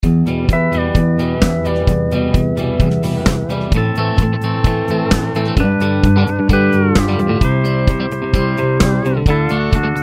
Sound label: Raging train 130bpm; MASTERTRACKS